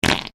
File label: human fart; McKinney Sound